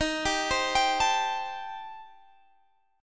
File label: D sharp 6th Flat 5th, D sharp, down, Guitar, Standard; arpeggiated; JGuitar